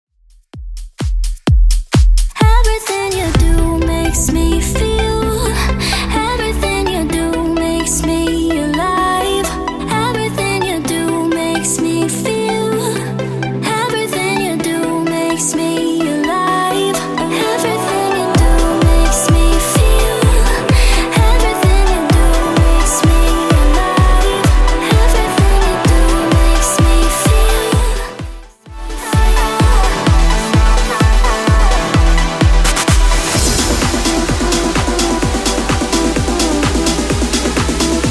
EDM DJ Friendly 128bpm

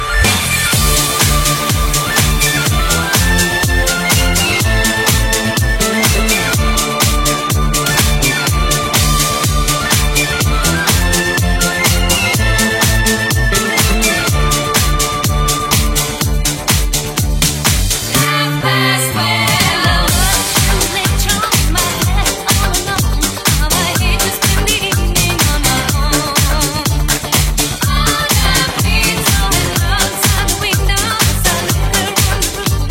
Disco, 124 bpm, Dm

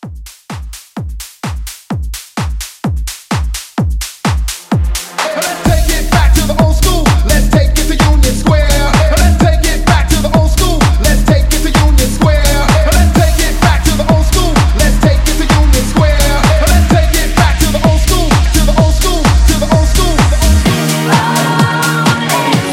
House Hype 128

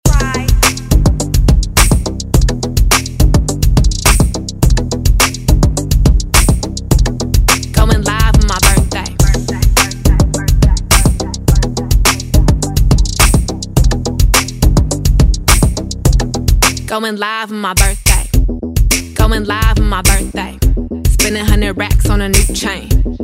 Top40 Redrum

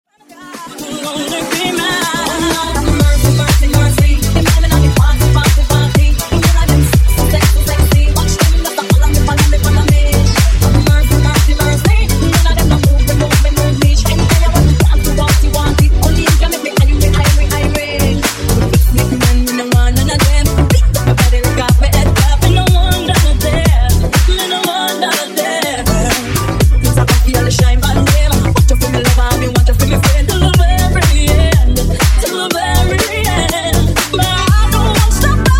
Disko Funk Remix 122Bpm

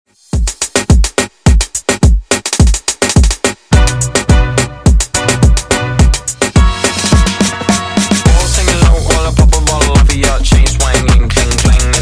Moombahton 106bpm; Quick Hit

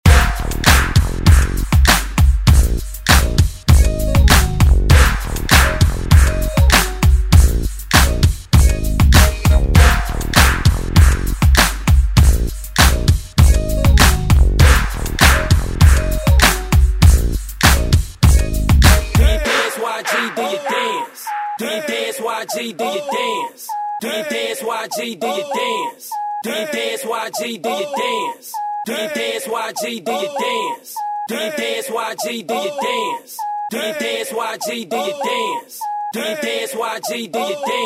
Acapella Drop Intro

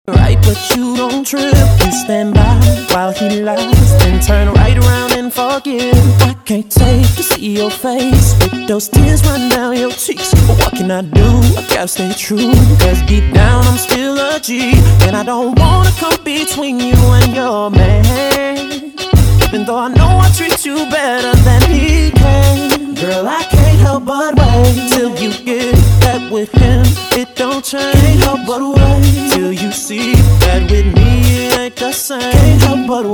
Classic Party MashUp

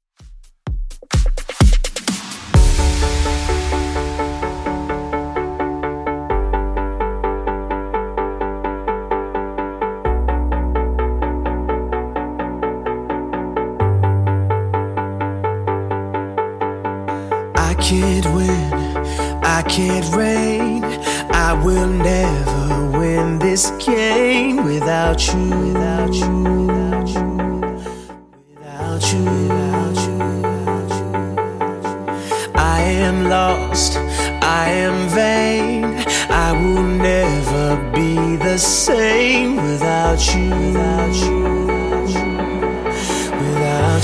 128 bpm

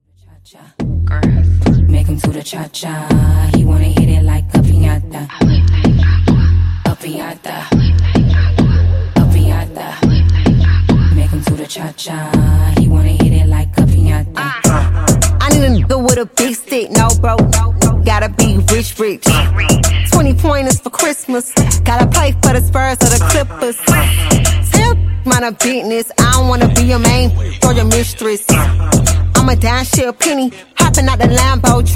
Funk Intro Break Outro Clean 104Bpm

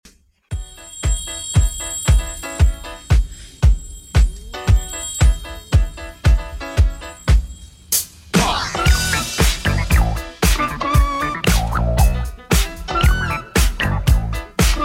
80s Redrum 115bpm CLEAN

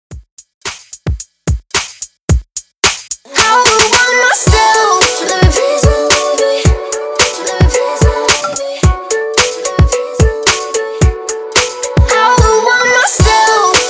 110 BPM

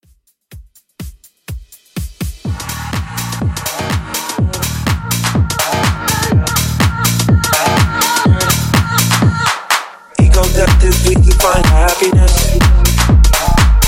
Tech Deep House Redrum; Remixfreakz

